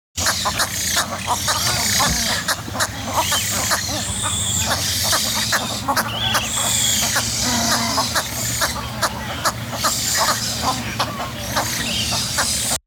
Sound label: cattle egret call